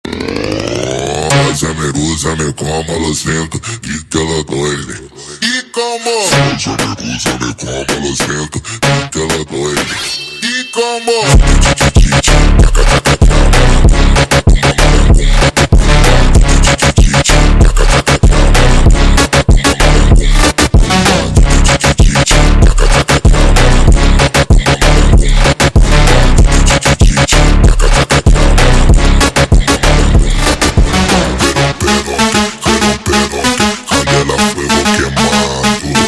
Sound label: Montagem ultra Slowed